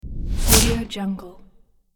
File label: arrow whoosh sound